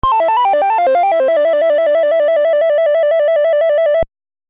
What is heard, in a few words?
Shop Descending; Clash at Demonhead; nes